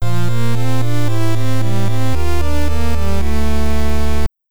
Item Acquisition Fanfare (Famicom Disk System ver.) [Stereo]; Metroid